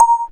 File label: A#, Synclav FM PERCUSSION; Big Fish Audio